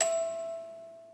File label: musicbox